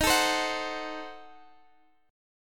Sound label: D sharp Diminished 7th, Guitar, Half Step Down